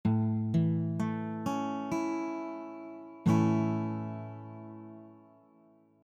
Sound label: A Major, guitar chord; A Major chord basic